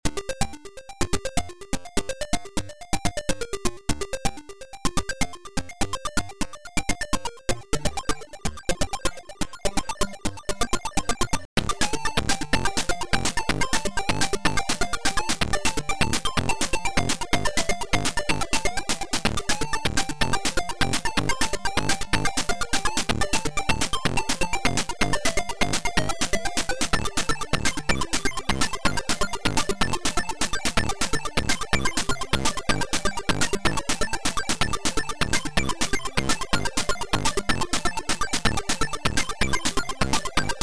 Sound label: fast chiptune